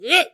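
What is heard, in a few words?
strange male scream short active E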